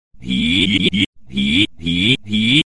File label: EEEE